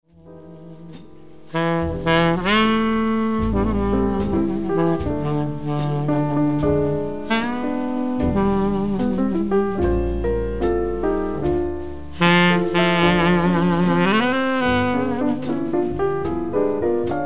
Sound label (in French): saxo